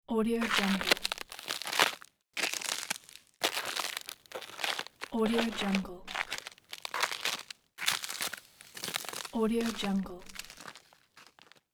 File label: fotsteps on thin ice